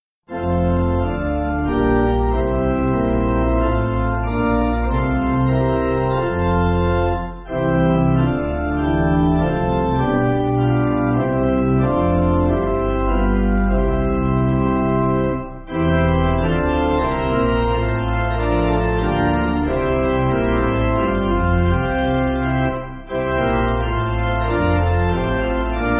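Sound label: Pipe Organ, Free Music Backing Files for Churches; Nurnberg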